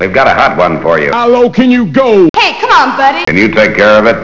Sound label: voices; scene music; atari st mods